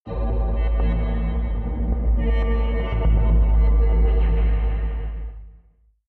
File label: wobble soundscape